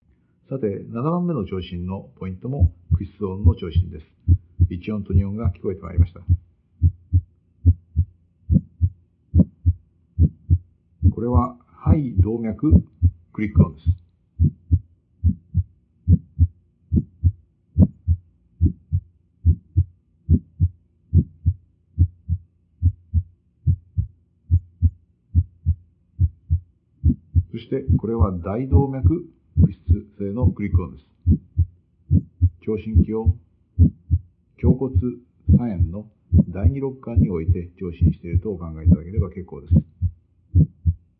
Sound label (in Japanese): 駆出音